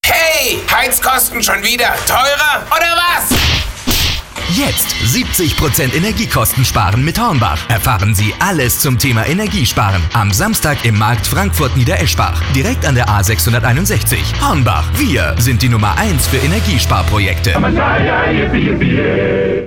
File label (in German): schreiender Opa; Hornbach